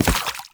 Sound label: Step Water, Foley, Footsteps; Enemies; Warframe; Lasrian Soldiers; Lasrian Butcher